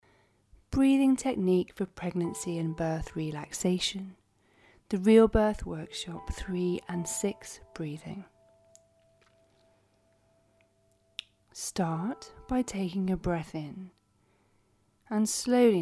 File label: breathing audio